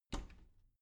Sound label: bathroom cabinet door open regular